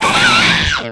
Kobold death; SFXEnemies; Dungeon Defender; DunDef

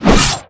Dark Elf Warrior Slash Attack; SFXEnemies; DunDef

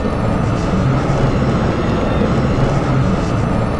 Tower Summon; DunDef

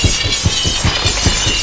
Tower Slice N Dice loop; Defenses; Dungeon Defender; DunDef

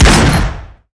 Blunderbuss Shot; Player; Huntress; Dungeon Defender; DunDef

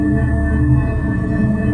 Tower Placement Mode Loop; DunDef

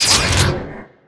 Pickup Weapon; Defenses; Dungeon Defender; DunDef

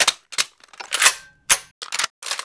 reloading; Player; Huntress; Initiate; Dungeon Defender; DunDef

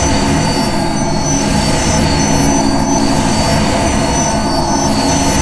Invest Mana Loop; Player; Dungeon Defender; DunDef

